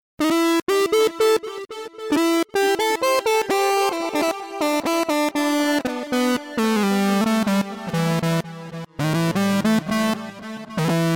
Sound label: Saw Syn